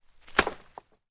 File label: impact tree branch small land on other branches on forest floor